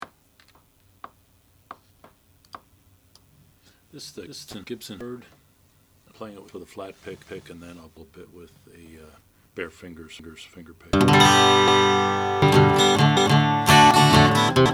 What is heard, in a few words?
gibson hummingbird natural